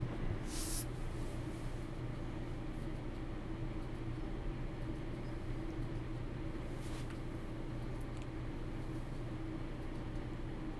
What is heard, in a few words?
Ambient Noise